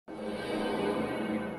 rain rain

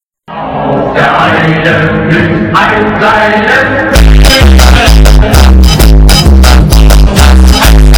german funk

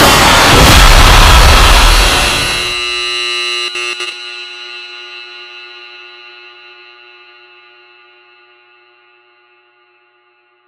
jumpscare

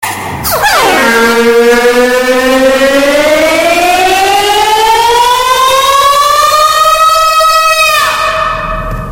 cry alarm

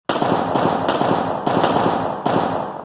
cracker

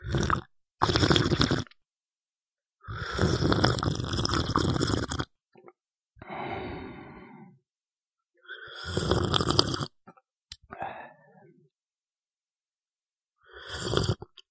slurping

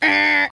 buzzer

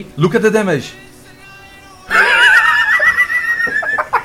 horse damage; marius

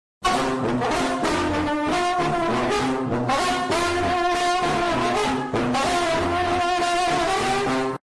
fail

